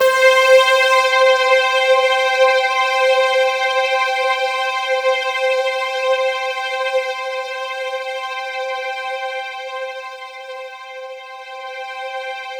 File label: C4, Roland JV 2080 CLUB ORGAN